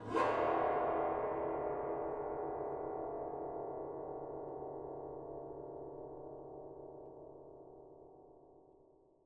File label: cave, ambient; minecraft; SMCNV-pack